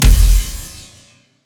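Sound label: Laser gun Flange Cannon Fire; Lasrgun; Robobeat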